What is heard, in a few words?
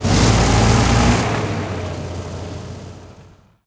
t64a engine load, tanks; War Thunder